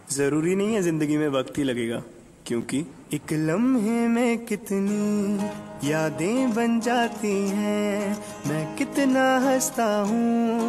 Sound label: Breakup Song Ringtone